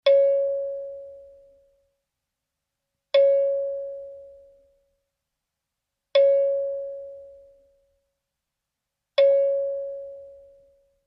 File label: 727 Jet Int Ding; interior perspective